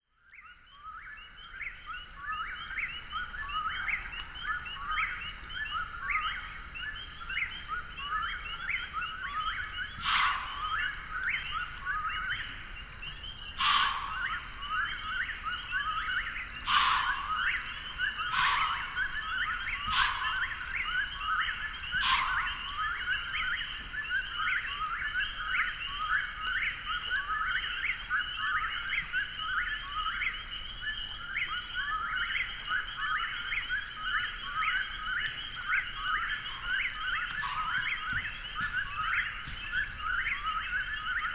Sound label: Marsh at Dusk Voices of the Night; Kentucky